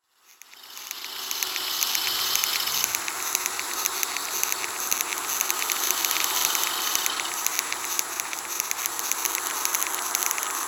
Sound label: insect